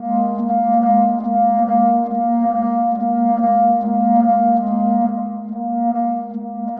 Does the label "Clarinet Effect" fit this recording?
Yes